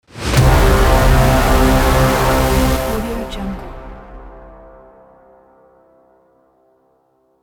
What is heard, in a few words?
epic cinematic braam